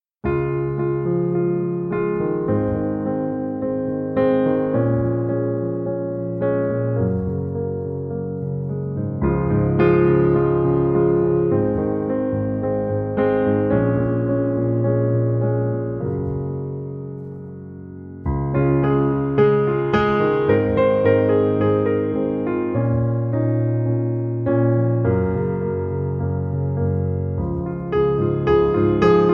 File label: Piano Version